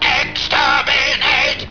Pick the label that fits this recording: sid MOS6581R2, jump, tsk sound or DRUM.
jump